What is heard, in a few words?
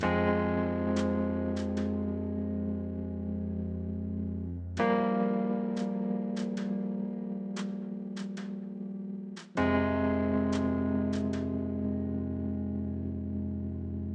Mozy Loop, 100bpm; jenny leclue detectiv